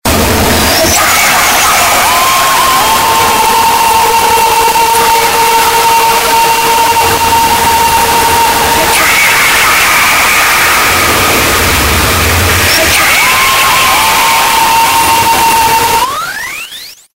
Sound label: jingle; ROHYPNOISE